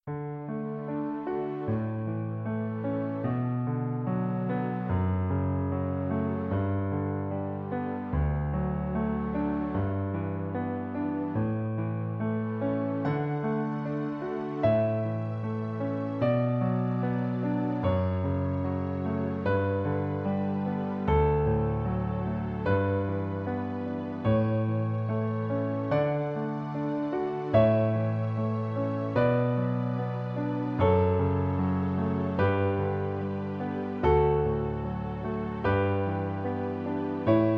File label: Canon In D